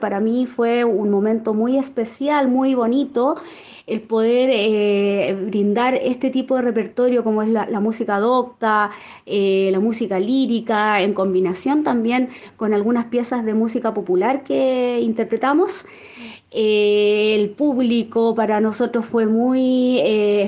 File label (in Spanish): Soprano; concierto canto lirico estacion limache